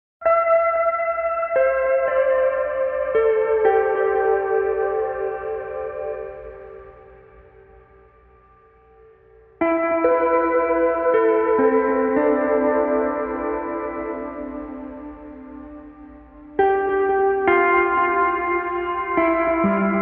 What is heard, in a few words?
Guitar Only